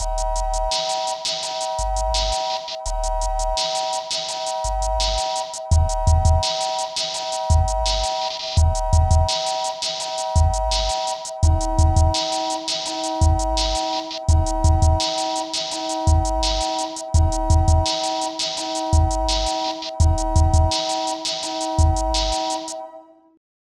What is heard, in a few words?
Sine 168 bpm